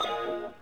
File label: Undo